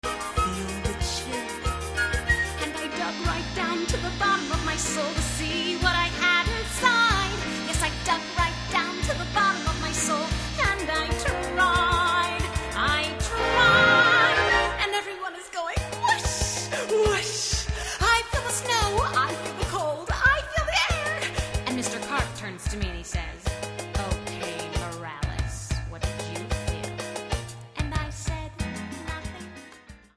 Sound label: chorus